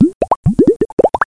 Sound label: Bubbles; HOLIDAY